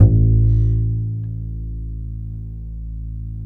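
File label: DB A2, double bass; Balkanish Traditions; USB Soundscan vol.63; AKAI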